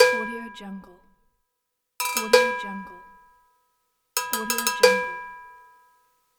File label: the cowbells